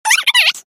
clock

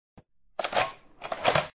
reload

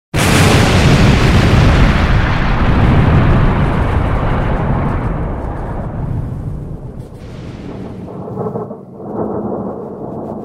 explosion; cave

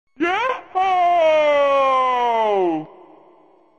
toad joy

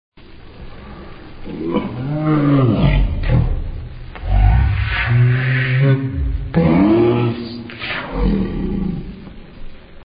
bath

